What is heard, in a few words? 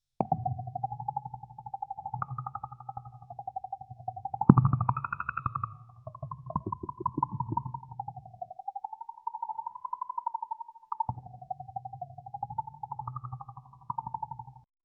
shark eat fish sound